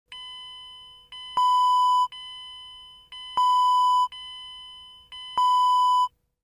car beeping

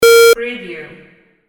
beep

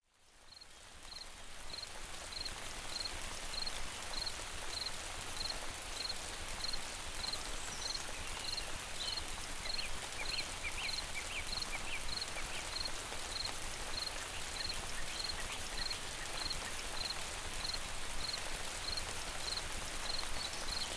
Summer Rains

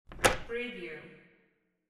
door open

